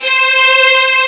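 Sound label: ensemble strings C7; Star Trek JR Audio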